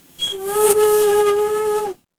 animal insect

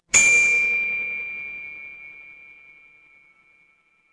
instrument chime bar

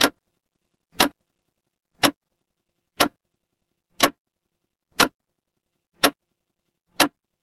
mechanical clock tick

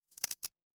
glass crack

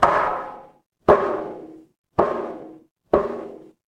instrument wood block

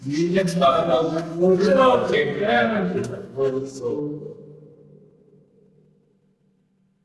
crowd murmur

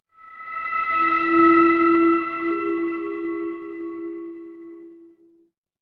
horror drone

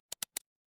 office mouse click

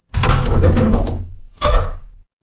door open

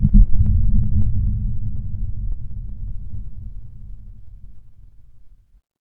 horror heartbeat

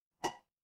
glass ceramic

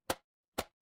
ui toggle

